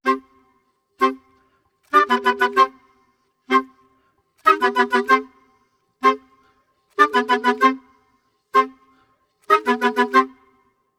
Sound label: 95 c min woodwinds, Orchestral arrangements